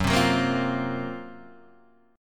F sharp Major 7th Flat 5th, Guitar, Half Step Down